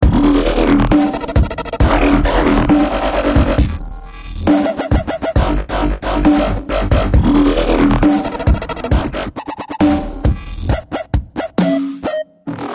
Loop Bossanova; Tales from the Borderlands

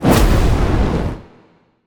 Attack Fire

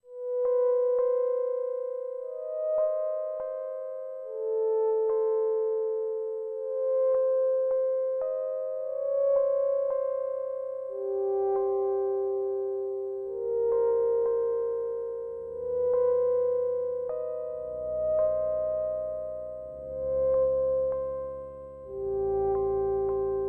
arp; Yuri

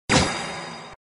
wizard attack

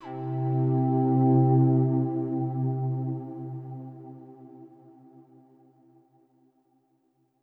Overlay Drone; Savant Extraordinaire

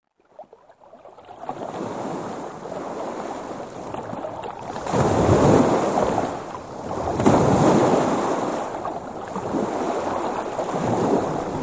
Ambience Seaside

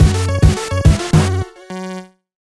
Retry Jingle Powerdown; Friday Night Funkin' Mario's Madness; PC mod gamerip

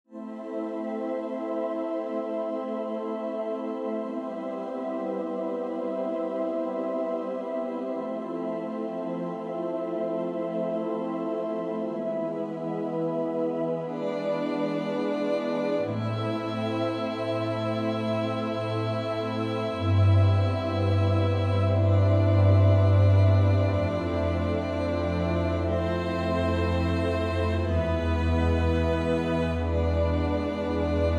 Ethereal Strings; Drizzlepath

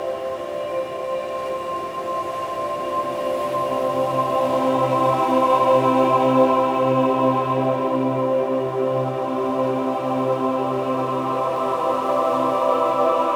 ui music; Sudeki

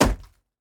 ice hit

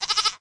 Kid Girl Idle

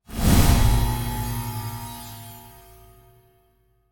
magic swoosh; luminous plume windows gamerip 2020

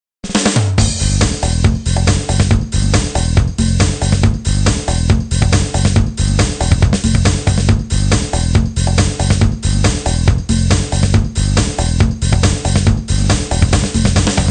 Music Success event

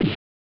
hit; Bishoujo Senshi Sailor Moon R; snes

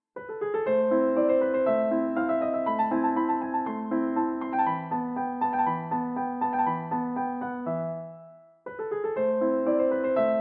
Turkish March

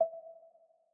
Move E 3 Mp

